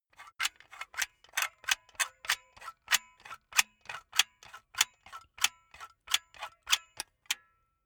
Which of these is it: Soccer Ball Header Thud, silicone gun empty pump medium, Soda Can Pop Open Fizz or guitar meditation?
silicone gun empty pump medium